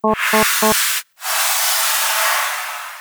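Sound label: Ventilation, alarms medical; J Edworthy 2019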